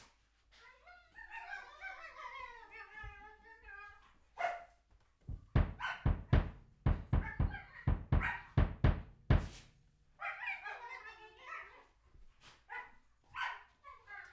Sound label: Banjo